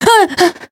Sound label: damaged